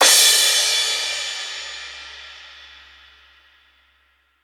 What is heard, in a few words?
long tail reverb crash cymbal sound A Key; cymbal drum samples